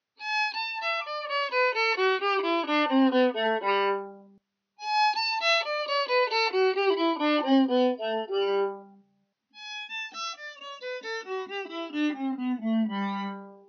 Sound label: Mute samples